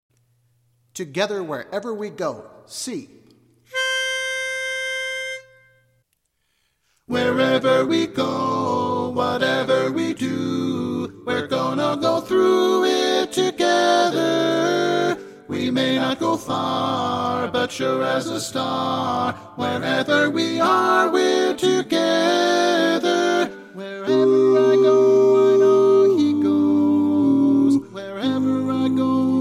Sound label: together tenor